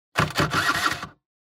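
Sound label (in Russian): звук стартера мопеда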